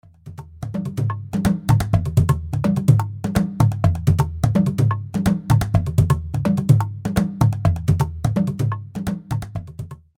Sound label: African timpany; Elementary My Dear Majesty